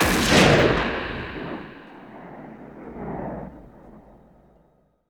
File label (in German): SCHUSS; Best Service; Advanced Mega Effects